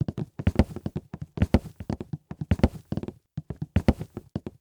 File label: MANNEQUINS RUNNING SOUNDS; don t be afraid the first toy